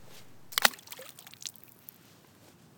Rock In Water Splash Plop

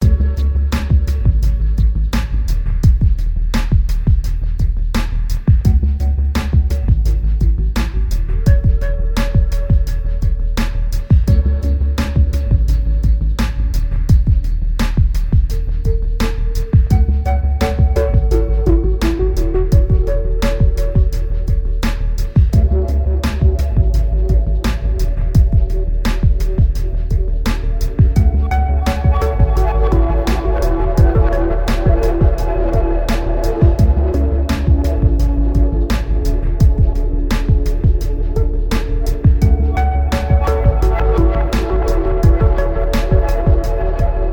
Drums Synths

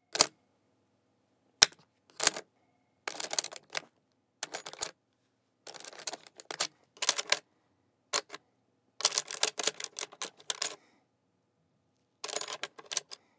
Metal Lock Rattling

